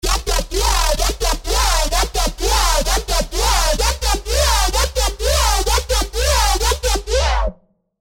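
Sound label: tactical nuke incoming; Serum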